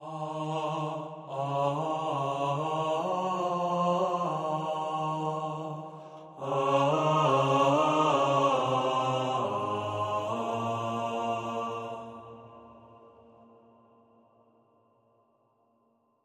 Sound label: Gregorian Male Choir